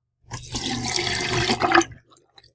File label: water; 少し水を流す音